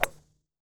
Sports Golf Putt; SFXSource

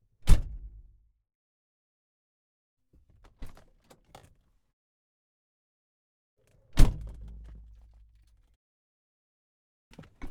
Ferrari, Testarossa, Doors, Interior; 1990; Pole Position Production

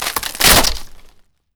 Destruction Wooden, Wooden Fence Destruction; InspectorJ

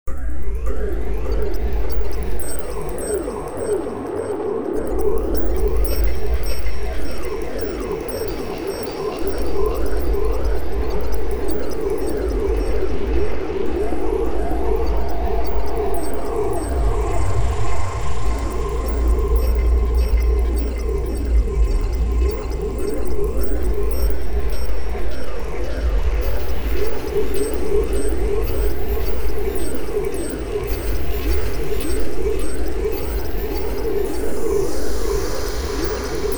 Creepy Setting, 70s Sci Fi

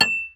metal pipe hit; Sounds Great

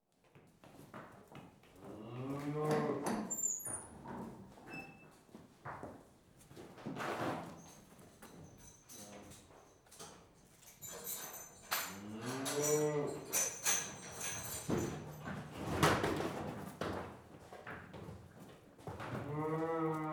Door Opens - One Cow Walks Into; Livestock; BBC Sound Effects Library

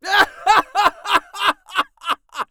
Voices Male Laugh Hard; Funky Rustic; FunkyVoices

